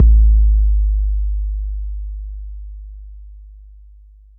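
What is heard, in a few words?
bass drum 808 Moog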